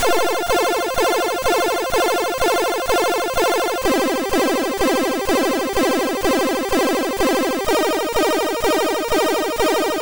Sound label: Music Demo; MOS6581R3